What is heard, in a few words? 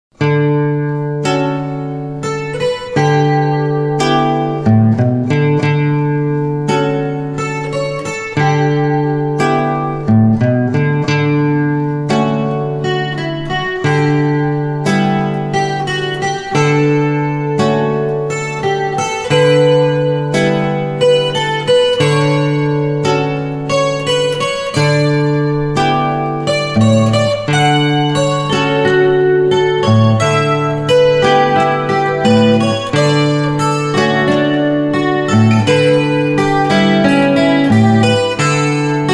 traeumereien, 2 Gitarren; blues; ragtime